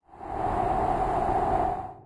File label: wind; smite; kukulkan